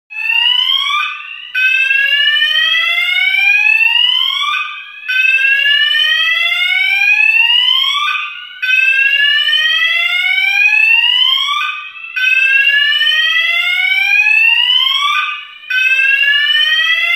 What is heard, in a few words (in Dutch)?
ontruiming new